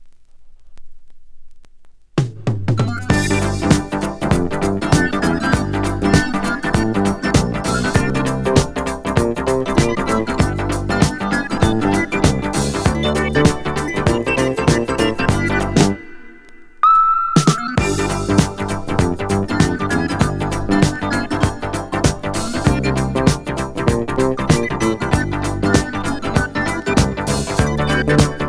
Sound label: honky tonk